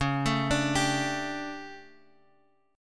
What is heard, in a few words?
D Major 7th Suspended 4th, chord